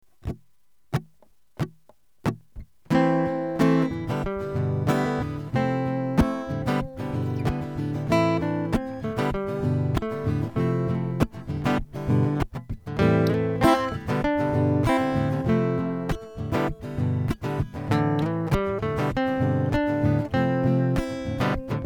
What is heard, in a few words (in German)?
riffs, Acoustic, Playback dazu in A-Moll (Am, G, F, G); ich begleite Euch mit meiner Acoustic